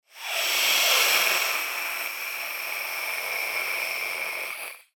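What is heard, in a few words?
vampire hiss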